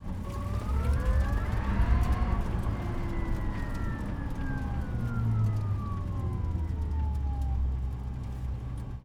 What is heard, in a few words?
electric car acceleration deceleration; Dolby Atmos; sfx